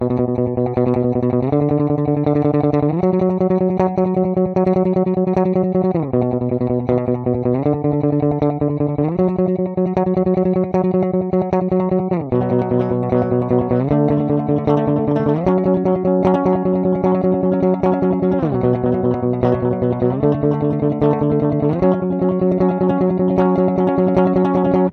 Guitar Riff

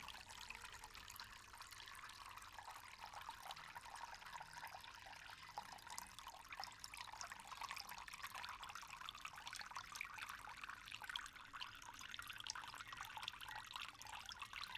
Water Fountain No Motor Spill

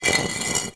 Brick Scrape

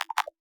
Ui Sound

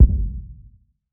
Kick Replica

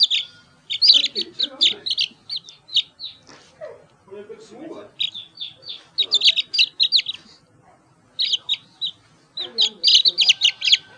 Chicken Chic Chirping

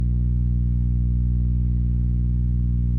Fan

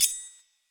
sword

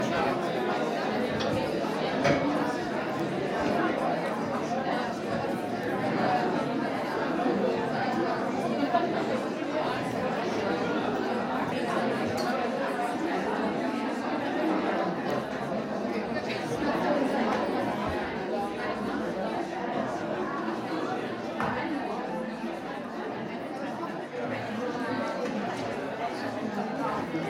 Busy Room Ambience People Talking In Background

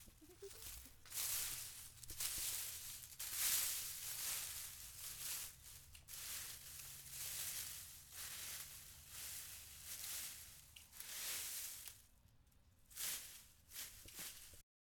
Leaves Being Harked

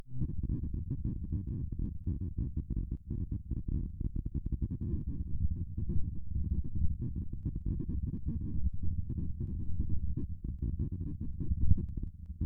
Eye Movements

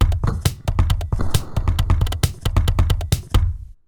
135 Bpm Loop

